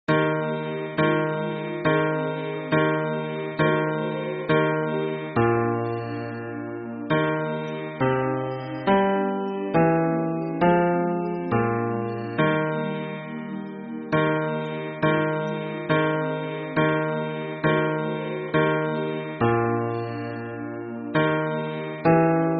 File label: hymn bass